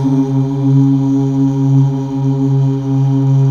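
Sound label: Male Uuh; Best Service Gigapack 1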